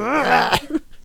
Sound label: Attack Vocals; Painter